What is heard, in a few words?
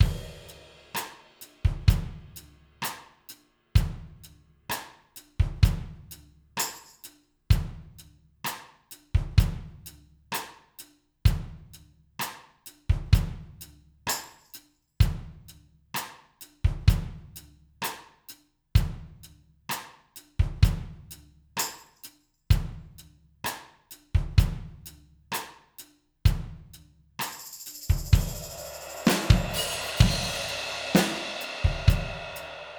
drums balance